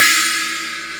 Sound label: China Crash Cymbal